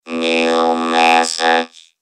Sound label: Message robot